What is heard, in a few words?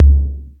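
808 low Tom; Quasimidi Rave-o-lution 309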